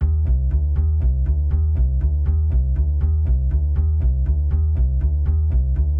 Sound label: Fight Music; Sherlock Holmes The Devil's Daughter